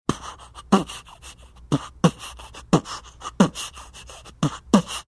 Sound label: beatboxing